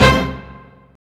tutti; Optical Media International; Sonic Images Library; SI FX Vol 13; Instrums

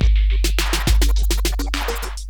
BEAT, Beats 102-105bpm, Hip Hop; E-MU Formula 4000 Series Vol. 1; Hip Hop Nation

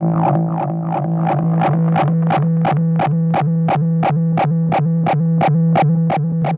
Tape Delay Feedback; radiosonics

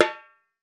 REPIQUE, Latin Drums; Latin Drums & Rain

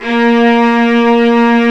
STR, Vlas Sect, Agitato; STR Vlas Sect1-2; STR Vas1 Agitato

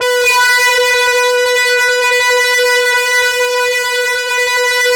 FLYER B3, Yamaha VP-1; Music Store; 90's Sample CDs

